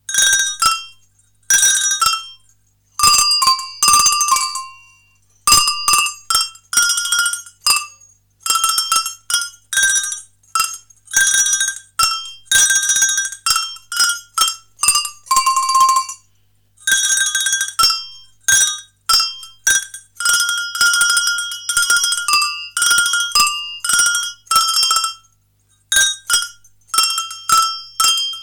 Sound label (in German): Schellen; Kuckuck & Esel